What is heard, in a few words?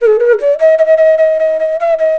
FLUTE D, Indian Traditions; USB Soundscan vol.45; AKAI